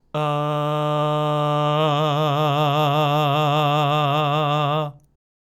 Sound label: vibrato C a fast